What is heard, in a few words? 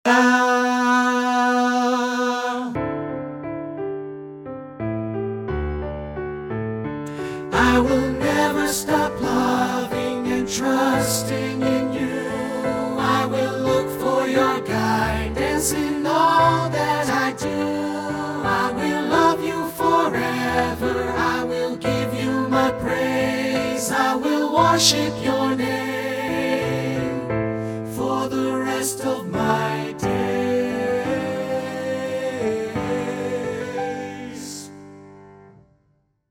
Tenor track